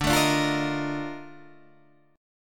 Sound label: A sharp Major 11th D